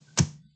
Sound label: candle Sound; hexhollow